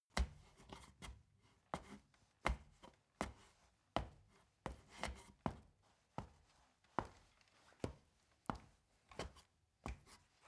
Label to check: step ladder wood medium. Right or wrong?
Right